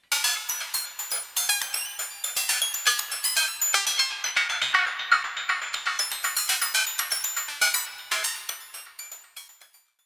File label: UNO Synth Pro X Presets